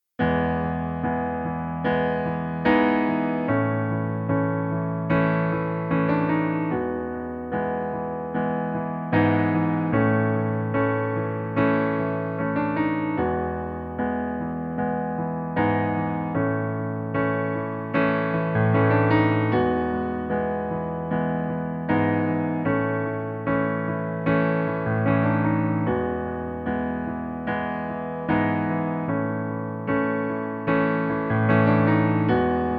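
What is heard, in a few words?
piano playback E major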